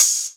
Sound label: Open Hihats, Cymbals; Vengeance Samples